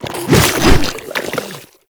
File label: Infested Ancient Strike Flesh; Warframe